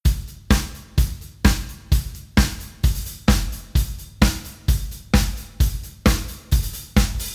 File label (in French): batterie